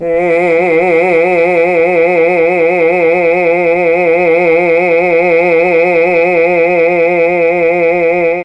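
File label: F4 chamber male, mellotron; CHMB MALE VC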